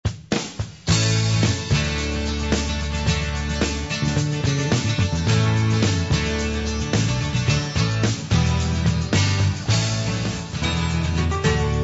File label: Acoustic Melodic